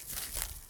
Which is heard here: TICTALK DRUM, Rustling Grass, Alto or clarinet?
Rustling Grass